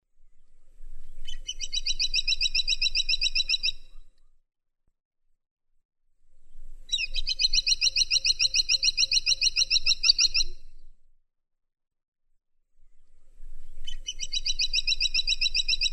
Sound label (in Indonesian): suara caladit